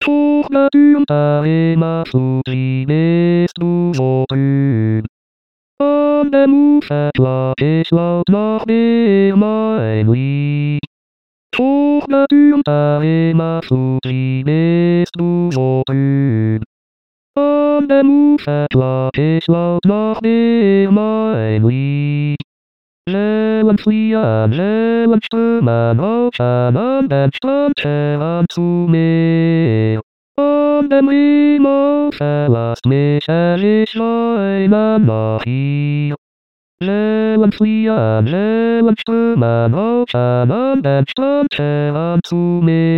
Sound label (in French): basse